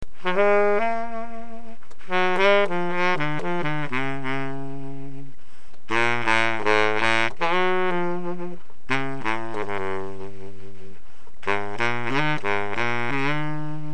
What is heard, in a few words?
bari